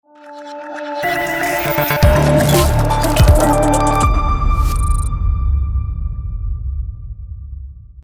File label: Digital Advertising Logo